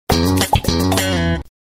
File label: Sitcom Bass Riff